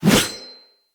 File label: Sword Swipe, Whooshes; Weapons; Melee